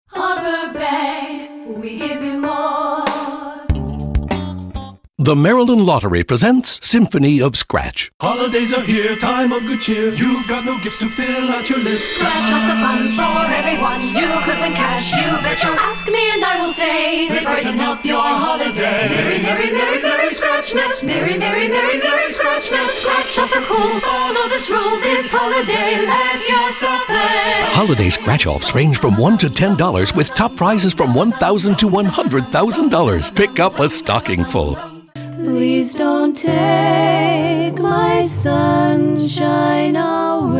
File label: jingles